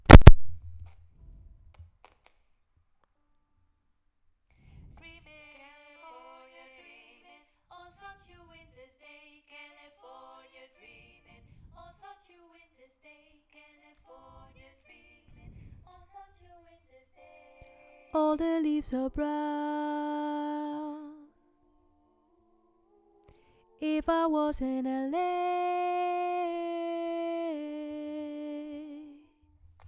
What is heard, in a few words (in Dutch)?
nieuw einde Solo